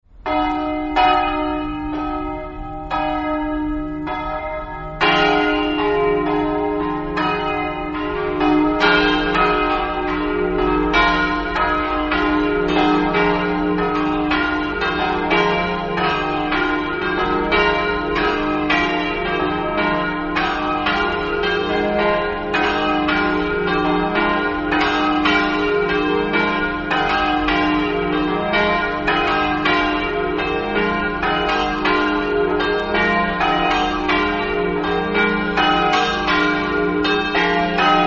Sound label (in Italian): campane